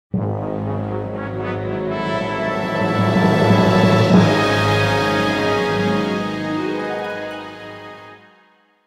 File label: Jingle-Floor Entry; The Nightmare of Druaga; Dungeon of Mystery